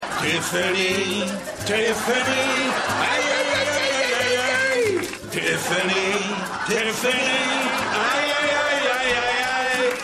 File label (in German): Mariachi Gesang; Stimmproben